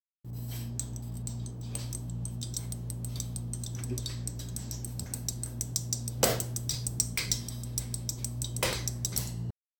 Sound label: faint sound of steam escaping